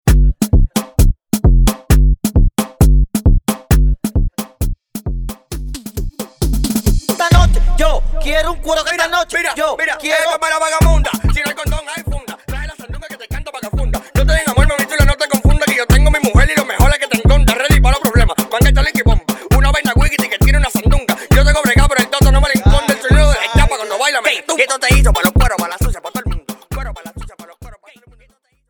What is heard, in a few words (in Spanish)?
Intro Verso 133 Bpm